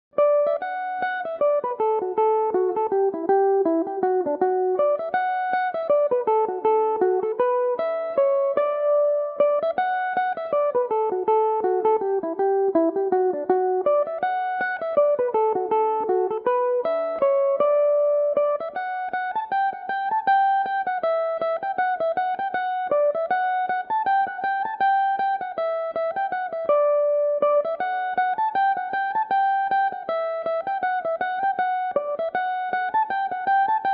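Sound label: mazurka d major, mandolin; The Glenties Mazurka; Belmuse EM-190 electric mandolin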